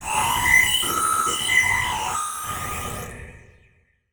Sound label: Production Element Title Transition Bed